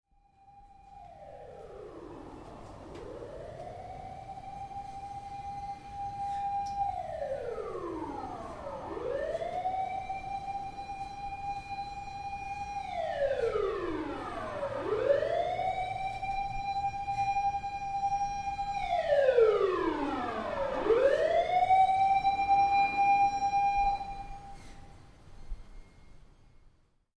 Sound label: Siren Distant To Close Tokyo Japan: Siren approaching; Ambulance, fire truck or police car approacin